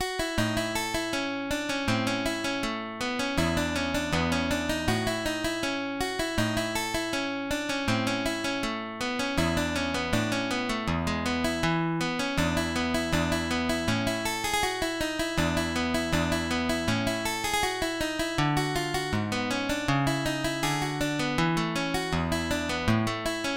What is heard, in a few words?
Rondo Allegretto, guitar